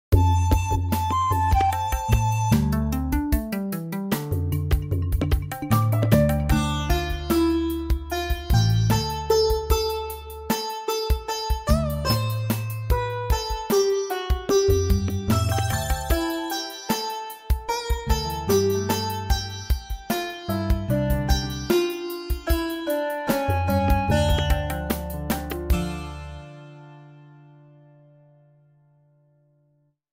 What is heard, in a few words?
vishu special instrumental music